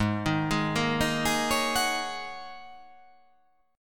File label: G sharp 9th Suspended 4th G sharp